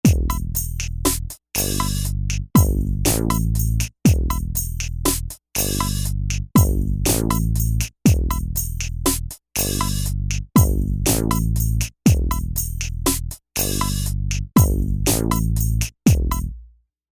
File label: Rap Hip Hop